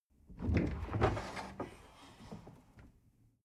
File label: dishwasher door open